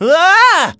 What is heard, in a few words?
wahh; sonic